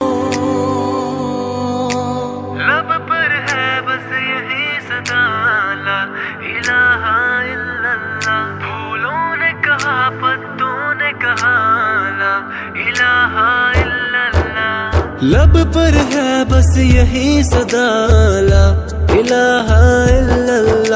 islamic ringtone

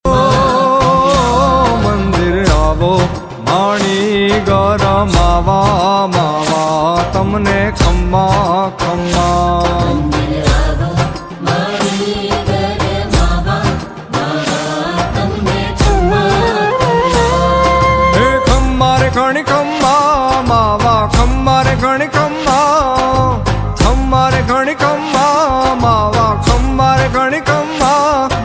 gujarati ringtone

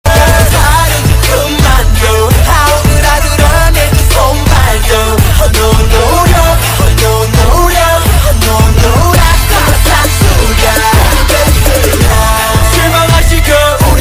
korean ringtone

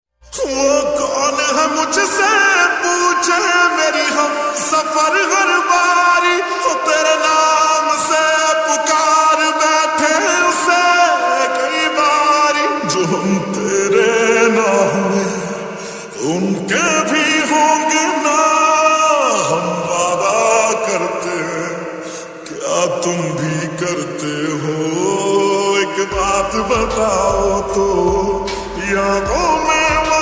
lofi, hindi ringtone

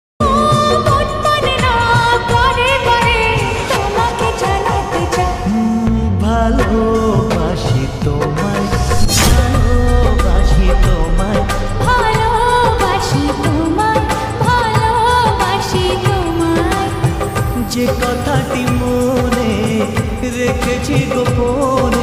lofi bengali ringtone

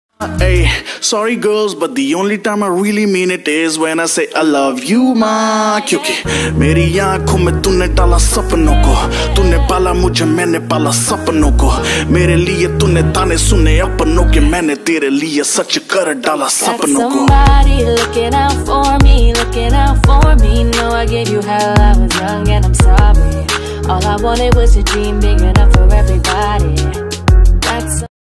rap ringtone

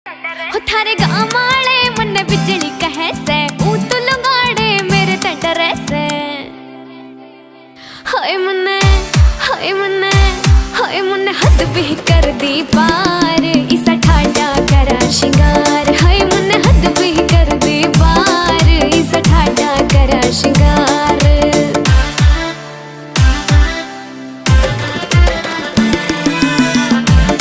haryanvi ringtone

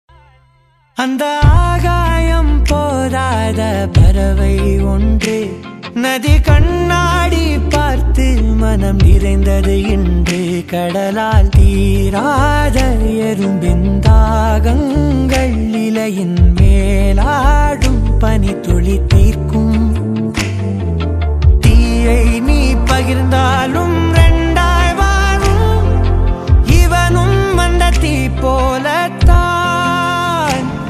tamil ringtone

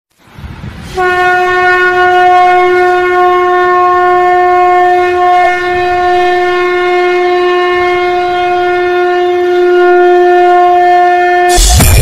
bhakti ringtone

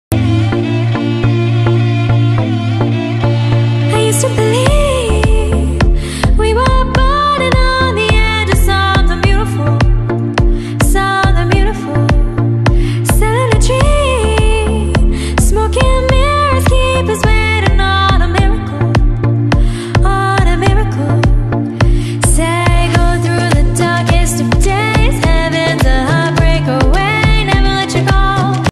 female version ringtone

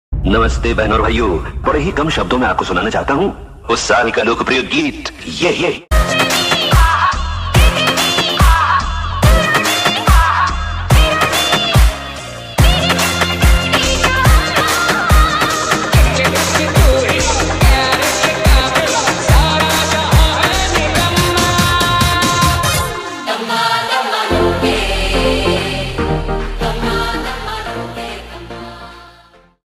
speedup rap ringtone

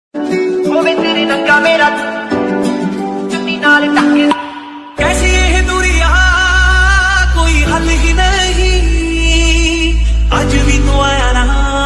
Punjabi Remix Mashup, panjabi ringtone

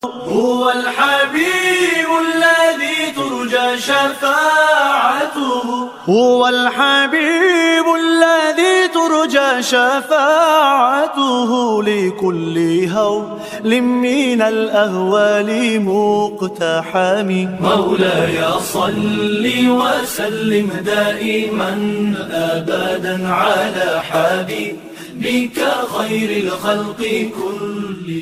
arabic ringtone

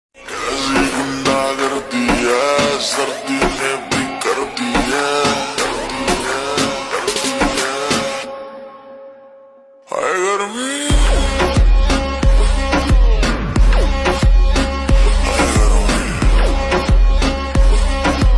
lofi remix rap ringtone

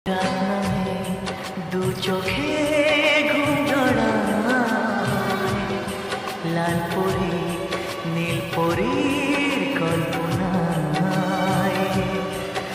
lofi bengali ringtone

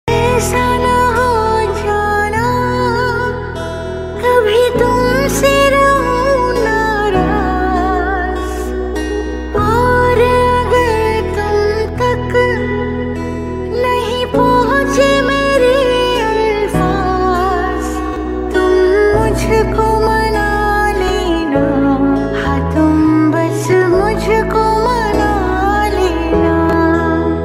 female version hindi ringtone

